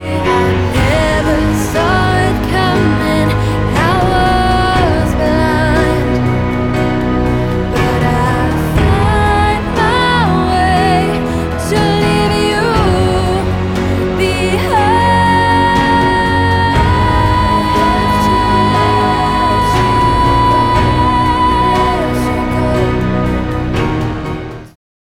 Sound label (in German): nachher, Studioqualität